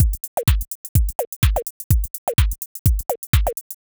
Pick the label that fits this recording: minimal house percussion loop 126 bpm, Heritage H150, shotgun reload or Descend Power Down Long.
minimal house percussion loop 126 bpm